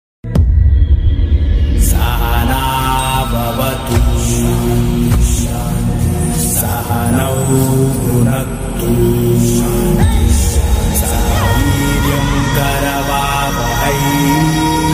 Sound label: movie background music ringtone telugu ringtone; acharya